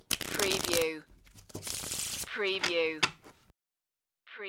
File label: Card Shuffle